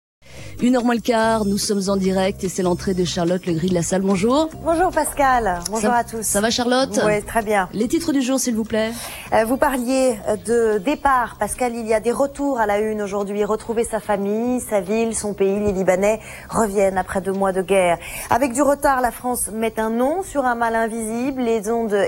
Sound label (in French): generique jt midi; cplus